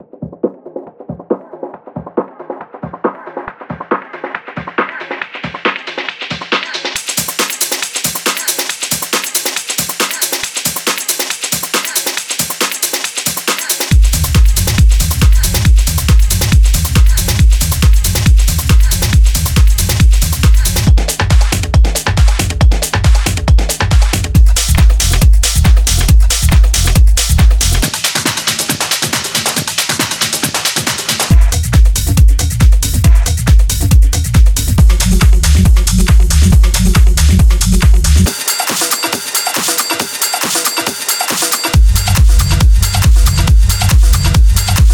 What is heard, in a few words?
hardgroove pressure loops